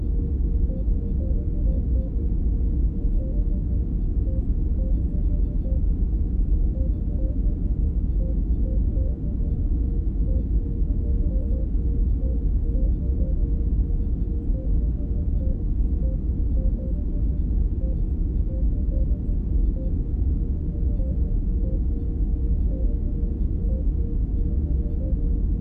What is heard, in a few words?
bridge sound